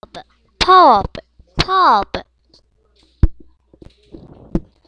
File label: pub